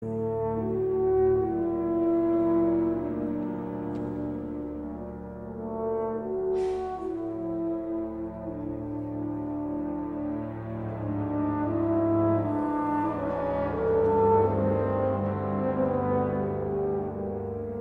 sviluppo corno clarinetto glockenspiel

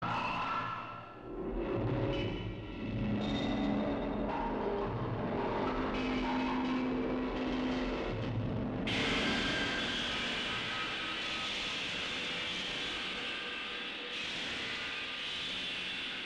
suoni fabbriche

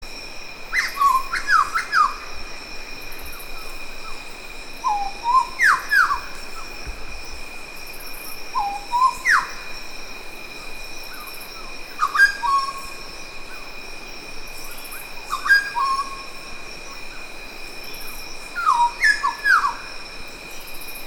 fenomeni imitativi

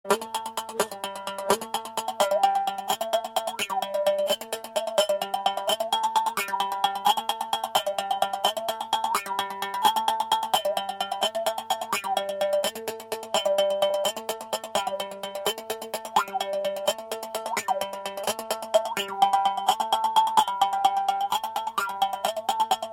effetti ipnotici legati alla trasformazione timbrica

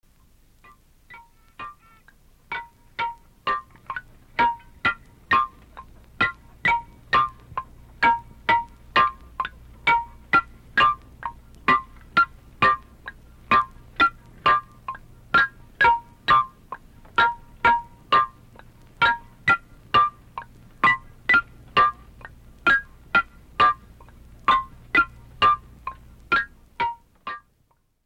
melodia ritmica filippine

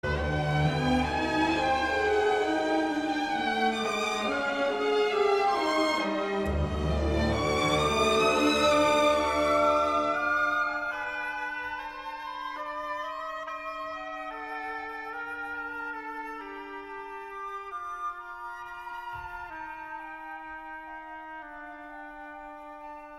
dialogo flauto oboe

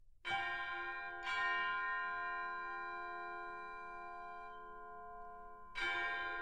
nona in apertura; deserts

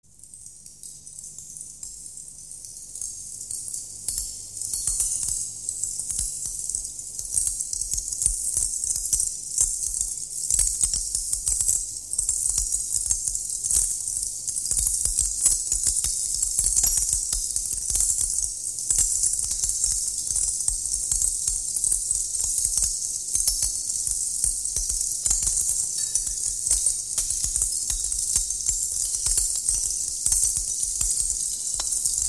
fondo concret

